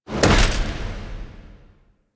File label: Fly Inner Depths Detach Land B; Sentient Devourer; Sentient Spawn Outdoors